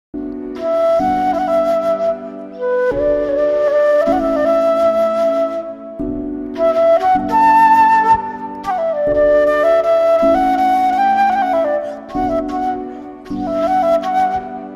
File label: bgm ringtone song bgm south Indian ringtone; aditya varma